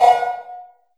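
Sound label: large room 808, reverb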